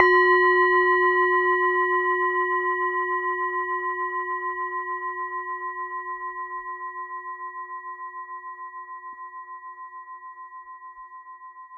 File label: Strike, Tibetan Singing Bowls, Large Bowl; Percussion Samples